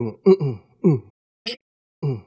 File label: dun's uh's, Beatbox, Drum loops